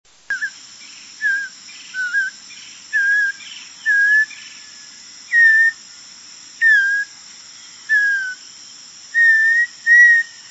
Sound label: Audubons Oriole